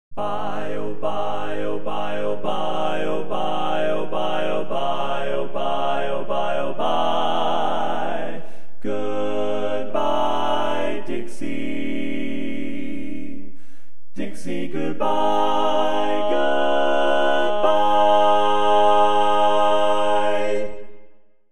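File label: baritone, barbershop tags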